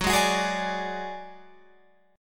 Gb Suspended 2nd Sharp 5th Gb, down, Guitar, Open F; strummed